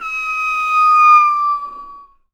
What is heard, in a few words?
bird call; Voice Crystal - Steve Reid Definitive Percussion